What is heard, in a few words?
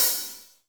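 hi-hat, Real Mega Drums; Best Service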